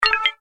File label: notifier